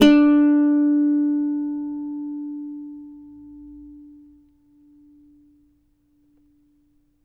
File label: CAVAQUINHO D, Guitars; Spectrasonics; Hans Zimmer Guitars VOL-2